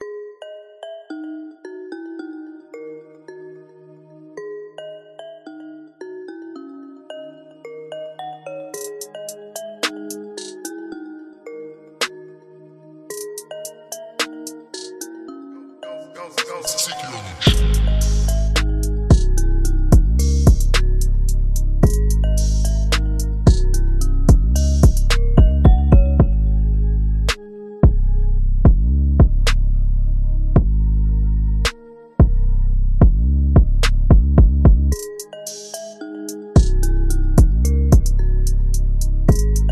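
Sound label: music future 110bpm g sharp minor; tzichi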